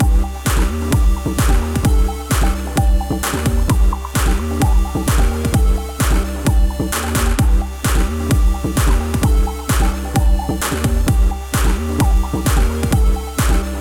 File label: train, rock sound